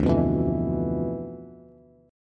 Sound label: D Minor 6th Add 9th D, Bass; Dm6add9 strummed; JGuitar